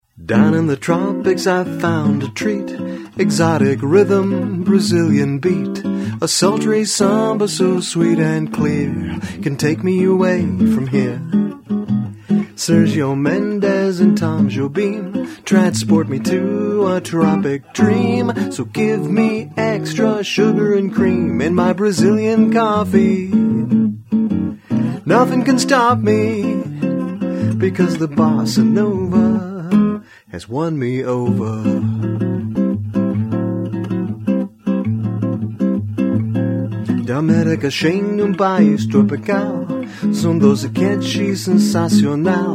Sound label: Coffee Break practice; RRSongs